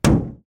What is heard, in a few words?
hit